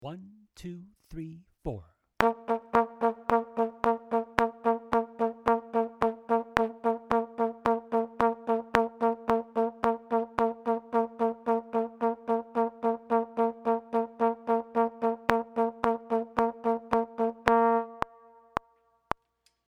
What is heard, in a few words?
Eighth notes at 110; with gap